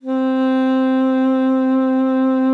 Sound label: C4, ALTOSAX; Xsample VOL-2